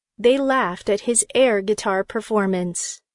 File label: guitar